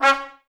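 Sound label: trumpets C#, brass; 90 s Sample CDs; Miroslav Vitous; Woodwind Brass Ensemble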